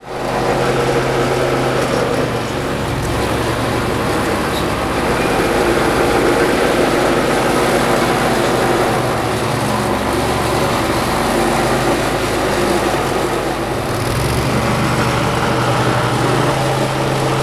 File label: ride on lawnmower; mechanical